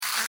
Servo Mechanical SciFi Robot Toy, Scifi Servo E Mechanical Robot Small Motor Toy; BBFX